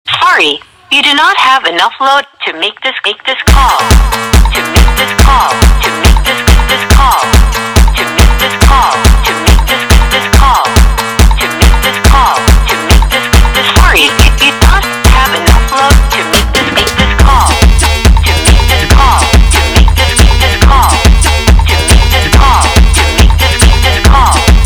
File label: Data Funk